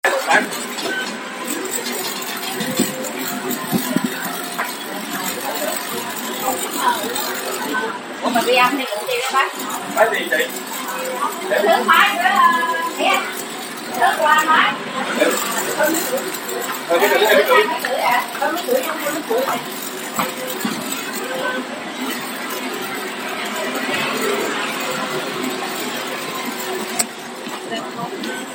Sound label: máy in 3d 3D printer